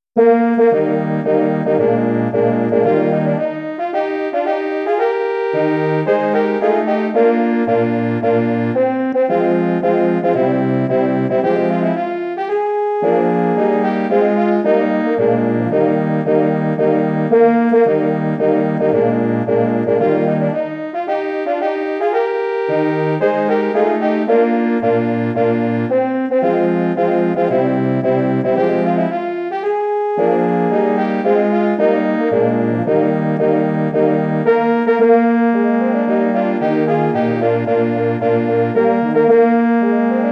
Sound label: Military March; Beethoven